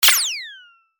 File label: long beam; pyuun